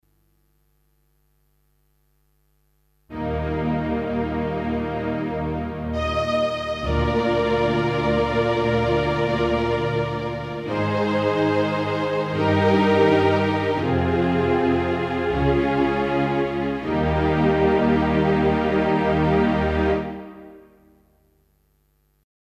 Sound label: P115 Strings